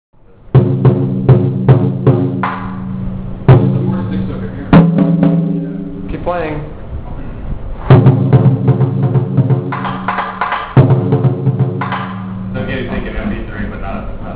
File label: Drum Museum; Japan